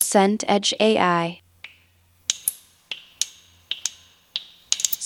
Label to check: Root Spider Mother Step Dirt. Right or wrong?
Wrong